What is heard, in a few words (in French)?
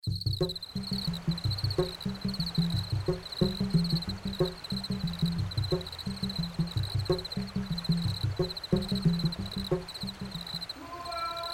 SANS VOIX